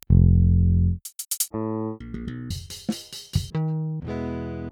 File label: Pultec; Virtual Analog